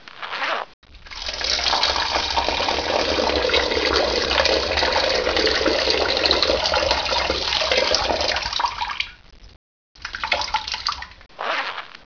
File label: piss